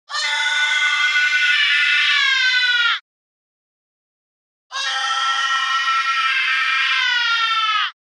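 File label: monster screams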